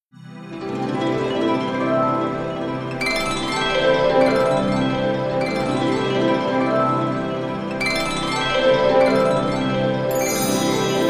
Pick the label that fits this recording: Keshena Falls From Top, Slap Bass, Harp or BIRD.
Harp